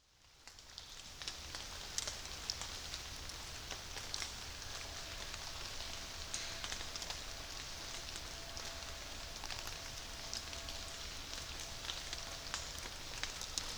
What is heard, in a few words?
half hour morning, autumnal birds; Schonbuch